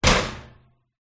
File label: industrial metal cabinet door impact close hard; zapsplat